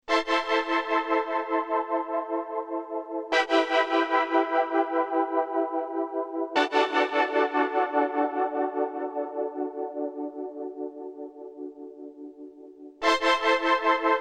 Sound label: Tremolo Saw